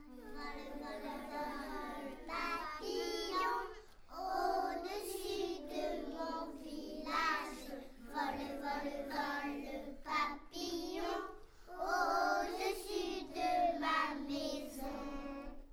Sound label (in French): vole vole vole papillon